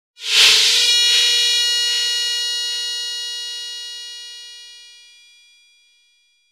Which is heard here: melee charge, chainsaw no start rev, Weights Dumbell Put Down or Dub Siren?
Dub Siren